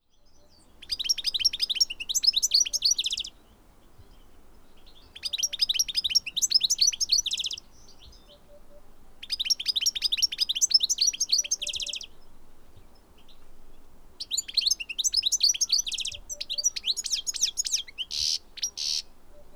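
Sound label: goldfinch carduelis carduelis, birdsong; schegol; peniya ptits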